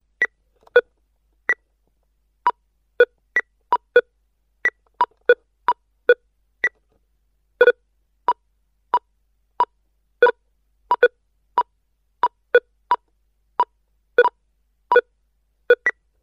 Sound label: pong